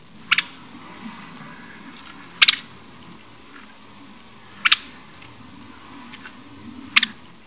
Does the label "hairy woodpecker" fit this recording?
Yes